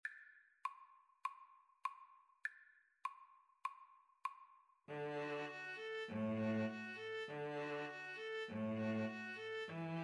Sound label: neopolitan, String trio